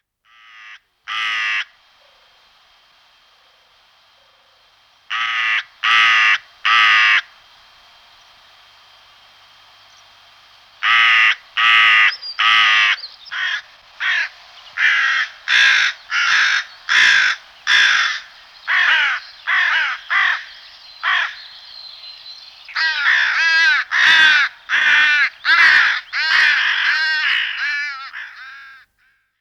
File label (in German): rabenkraehe, singvoegel gesang; roche 2004